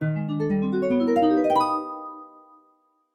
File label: (Jingle) Open Curtains; Swapdoodle OST